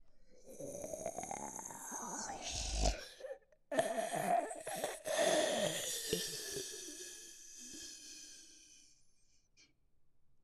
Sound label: zombie say; mob; minecraft; TBMPack